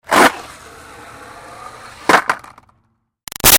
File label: Skateboard Jump Wheel Spin Land; Skateboarding; Sound Effects:Sports; Big Room Sound